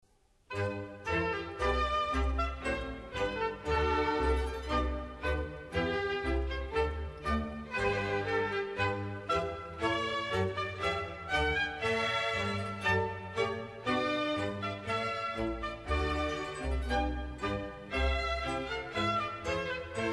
Aria (Gavotte) Sehet in Zufried; Cantatas; Bach; Famous Cantatas II

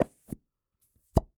Plunger Pop And Air, Household

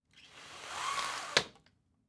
Window Blinds Pull Down, Household

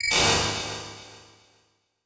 Transition Hit Impact Short; Adobe; Production Element

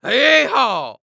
Human Male Yee-Haw Old Western Yee-Haw

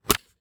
Technology Electronic Audio Tape Case Open

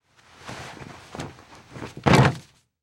Household Blanket Thrown To Floor

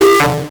Beep Electronic; Multimedia Internet CD-Rom Flash

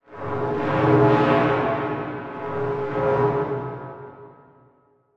Transition Metallic Warble; Production Element

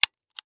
Cartoon Toy Gun Shoot Cap Gun Single

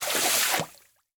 Liquid Water Water Spray Burst Of Water

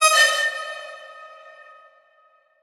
Transition Bell Hit; Adobe; Production Element

